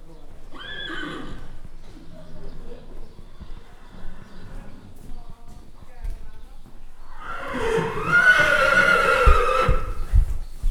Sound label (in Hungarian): lovat kivezetnek nyihogás, istálló; termeszetben